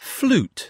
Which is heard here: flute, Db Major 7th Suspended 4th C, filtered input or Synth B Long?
flute